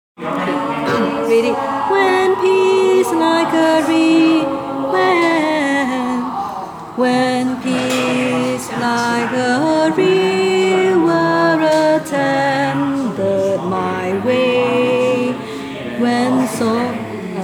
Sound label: Bass